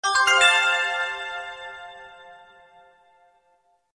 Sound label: chat msg tone